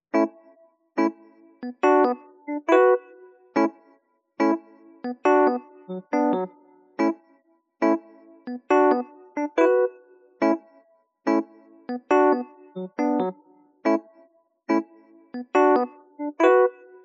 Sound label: music home epiano